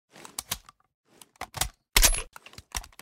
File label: mp7a1 reload empty; Tacz1.1.5+; RSWS 1903